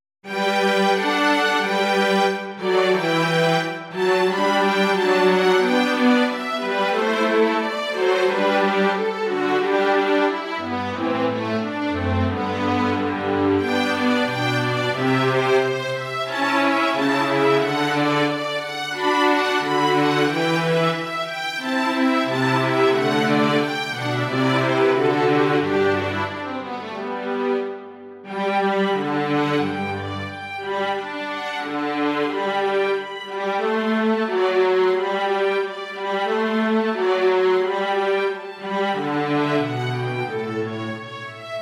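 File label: Vivaldi concerto G major for violin; a.vivaldi; koncert G-dur dlya skripki 1 ch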